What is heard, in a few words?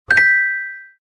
coin; HeroRush